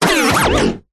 science fiction robot large mechanical movement

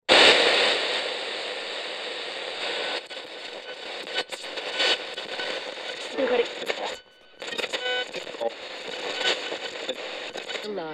technology radio static interference tuning

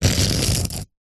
foley rock or stone single scrape cut score knife; zapsplat

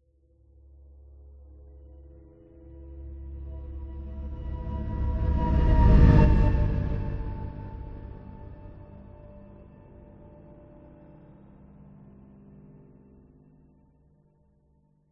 science fiction spacecraft slow fly by; Audio Mangler

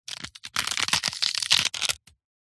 horror bone break snap twist or crush; zapsplat

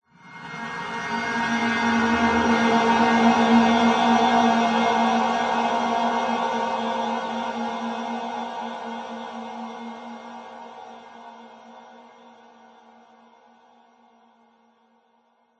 horror swell chilling dark sinister

